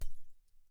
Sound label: shatter; taekwonmaster; TKmasterVR; BNG Framework